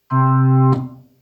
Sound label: hammond C